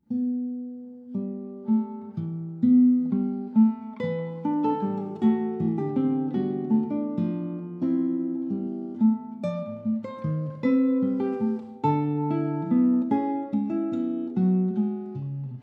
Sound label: Rubato espressivo